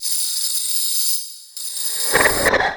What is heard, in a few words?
loop, techno atmos